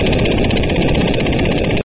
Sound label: Machine gun; gridtracker